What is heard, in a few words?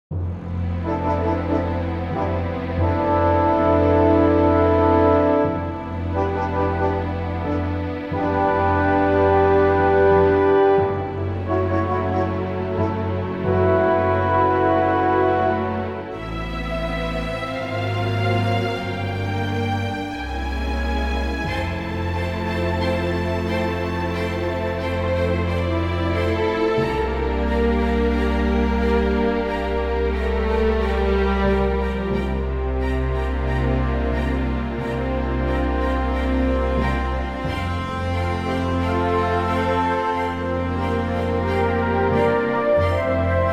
F orchestral; key - F - vocal range - C to D